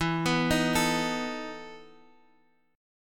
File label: E Major 7th Suspended 4th E, Guitar, Half Step Down